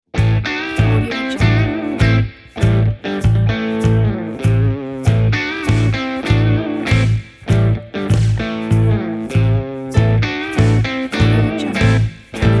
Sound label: heavy southern style acoustic rock